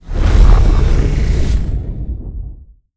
sfx cruiser moving out; Stellaris; nemesis

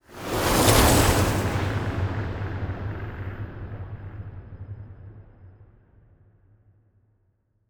ship battleship move; Stellaris; toxoids; ships

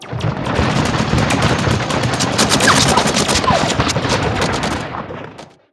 ground battle; Stellaris

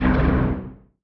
distance laser hit; weapons; Stellaris

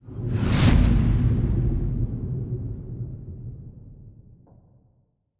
battleship move; aquatics

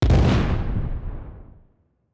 kaiju titan hit explosion flash; Stellaris; biogenesis; weapons

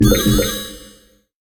notification alert breach; Stellaris; federations

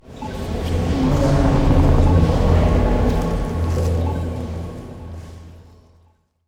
senate floor; Stellaris; federations

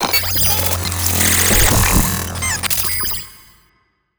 sfx relic activation; the omnicodex; Stellaris; ancient relics

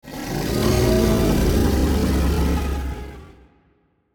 kaiju juvenile rage roar; biogenesis

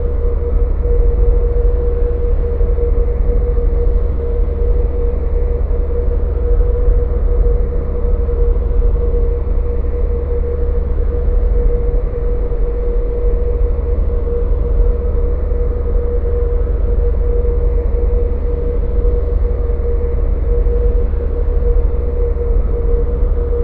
amb city planet; Stellaris; megacorp

